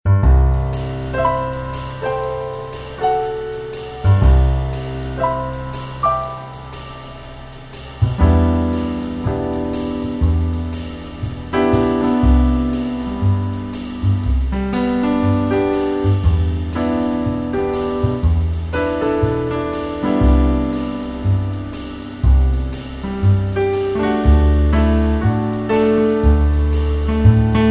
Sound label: Cozy Jazz